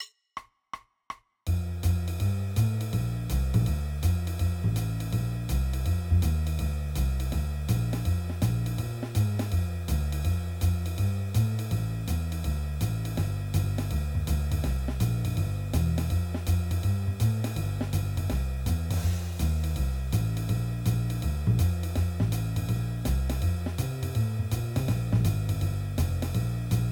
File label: Piano Guitar